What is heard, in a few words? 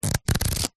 household book very small pages flick through